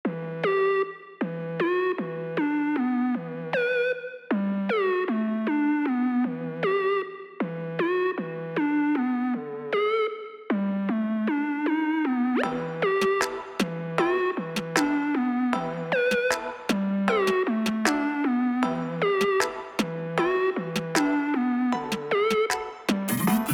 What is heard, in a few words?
Trap